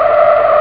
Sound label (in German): reifen brems; gp500